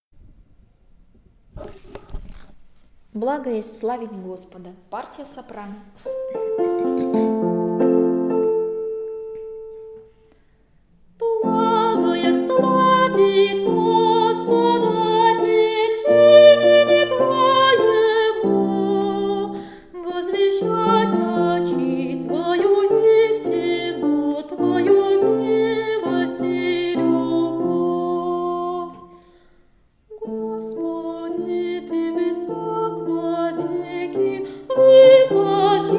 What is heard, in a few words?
soprano; Blago est slavit Gospoda